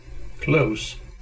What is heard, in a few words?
close